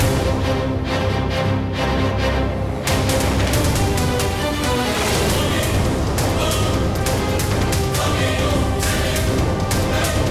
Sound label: BGM Last Boss Battle